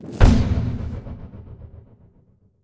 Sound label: illusion invisibility in; skyrim